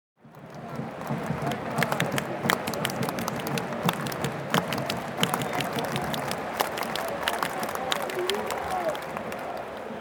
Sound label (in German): Stadion Buhh und Pfiffe